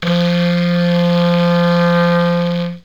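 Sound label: clarinet doubled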